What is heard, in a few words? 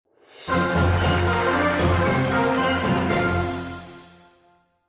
Fight Win; mighty party 2017 gamerip pc ios android